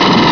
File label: pulse; aliens